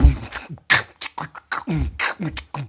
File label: wet, Beatbox, Drum loops